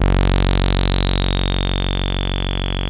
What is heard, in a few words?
SAWREZO, BASSES; Masterbits - Vintage Voltage; VV BASSES